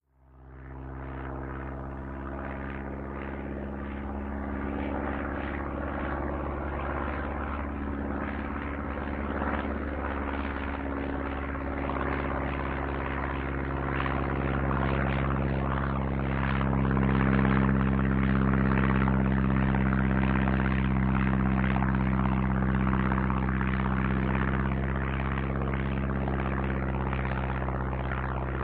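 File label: Med CU Prop Plane Flyby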